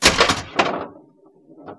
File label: Create Ball